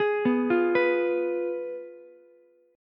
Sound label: F Diminished Ukulele; Fdim arpeggiated; JGuitar